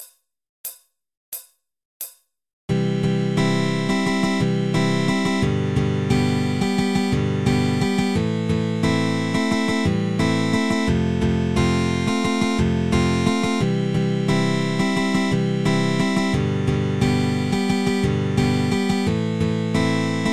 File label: open chord progression, guitar